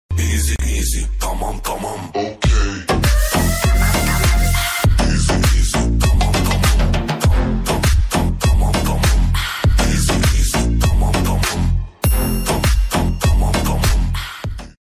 music trap english ringtone

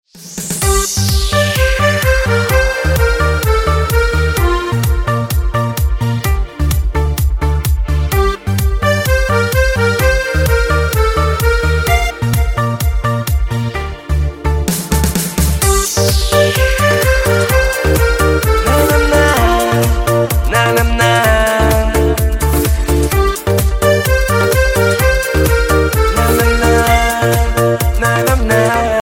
epic tone ringtone; samsung

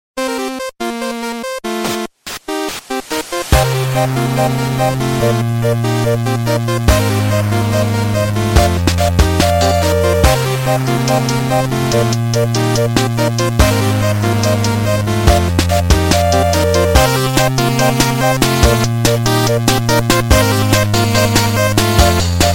opening iphone ringtone

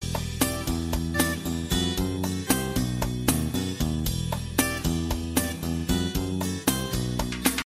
violina samsung classic ringtone

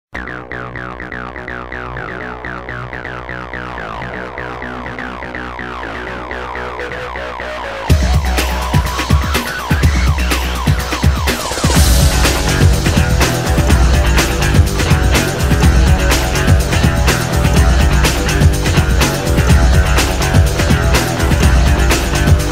apple instrumental ringtone

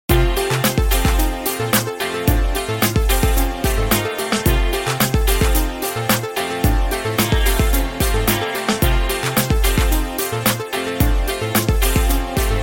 super track ringtone

